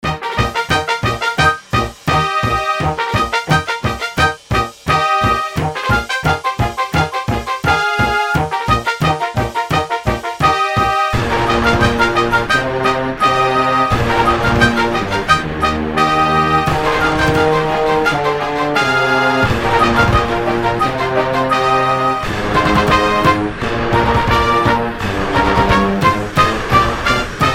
Strings Brass Timpani; Syntheway

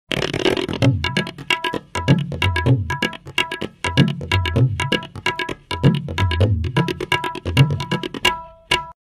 tabla loop

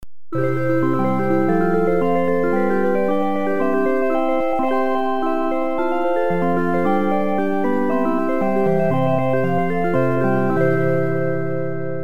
Xylophone; Zephyrus; Syntheway

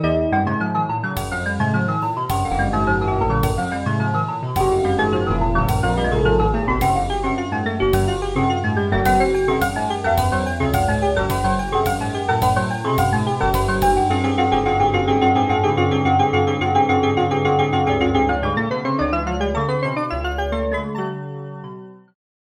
Oscillator KEY Percussive Organ; Alphatron; Syntheway

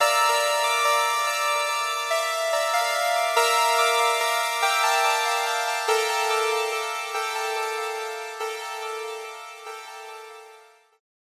Bright Echoes Space; Waverator Spectra

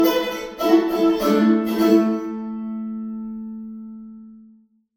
Theater Reverb Mandolin Wet; Virtual Room Reveb; Syntheway

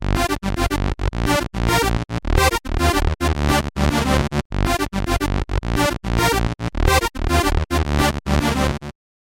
Moog Taurus Pedal; Bassesland; Syntheway

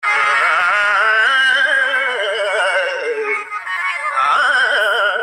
aaaaa

